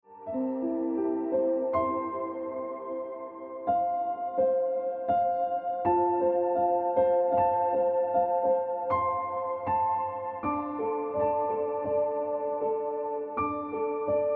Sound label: Ringtone Piano Music